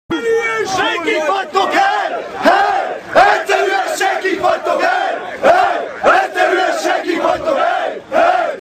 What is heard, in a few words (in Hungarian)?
Egyszerűen senkik vagytok, Hej, hej; militiagreens; szidas